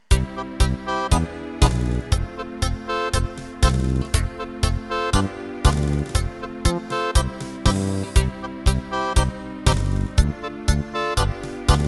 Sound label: Tango